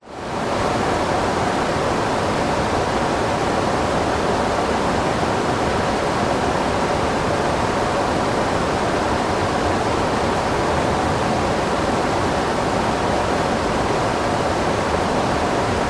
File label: nature river fast flowing wide distant